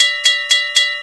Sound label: train crossing bell loop, alarms; Left 4 Dead 2; Source Engine